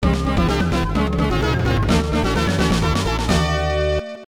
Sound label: Challenging Stage Start; Namco Classic Collection Vol. 2; arcade; Namco ND-1